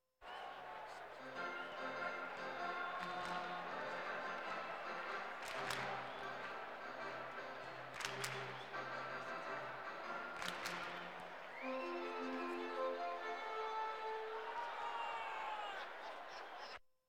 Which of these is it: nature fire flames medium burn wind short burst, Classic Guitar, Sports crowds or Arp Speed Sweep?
Sports crowds